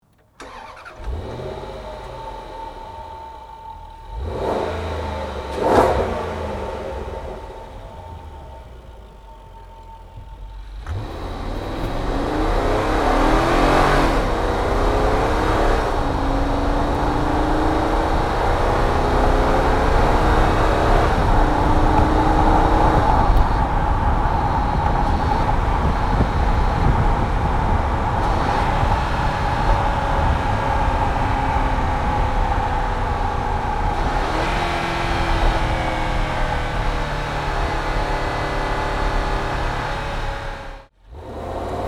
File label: Land Rover LR4